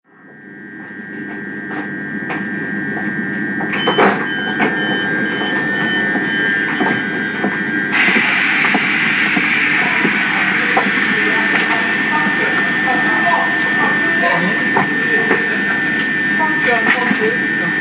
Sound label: Train Carriage At Station; Train Ambience Sound Effect - Sound Features Opening/ Closing Doors, Passenger & Crowd Noise, Plus Guard's Whistle